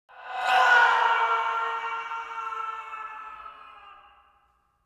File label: Ghost screaming